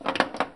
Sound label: Pinball Ball Bounce Rubber